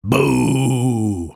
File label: Booo